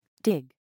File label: dig